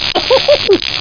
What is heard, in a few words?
shock